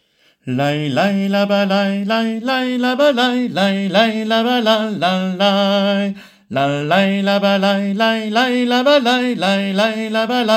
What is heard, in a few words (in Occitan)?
airejant melodia; ai vist lo lop